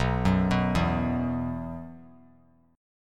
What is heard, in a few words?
B Suspended 4th; Bsus4 arpeggiated; JGuitar